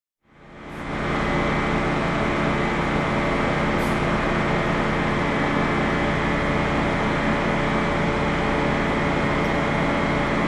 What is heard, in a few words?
Generator buzz